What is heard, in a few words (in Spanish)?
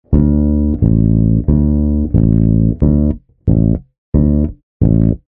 articulacion del bajo cumbia